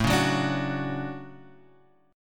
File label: D Major 7th, chord